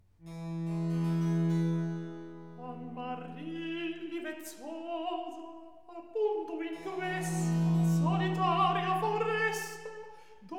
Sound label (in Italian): Recitativo countertenor; Il duello amoroso HWV 82; Daliso; Amarilli vezzosa; Handel Masterworks; Klassika